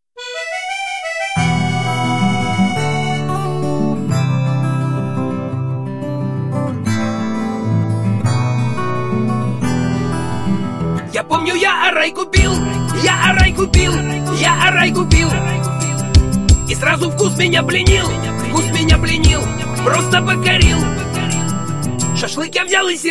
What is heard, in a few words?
rap